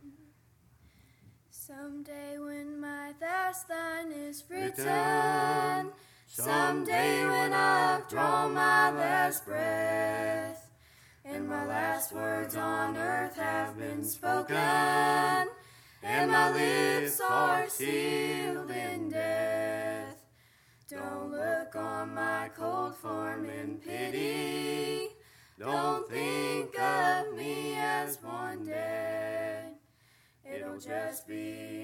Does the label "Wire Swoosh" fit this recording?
No